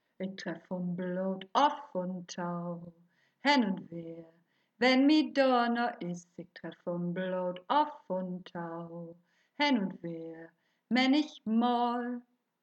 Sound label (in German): Refrain - Bass